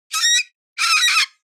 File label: cockatoo bird squawk